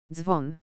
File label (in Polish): dzwon